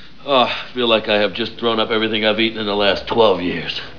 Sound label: thrown up; Objects in Motion; Garibaldi, "Awgh, I feel like I have just thrown up everything / I have eaten in the last twelve years."